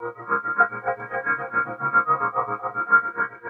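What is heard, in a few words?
Pad Synth; Equipped Music; Loungin House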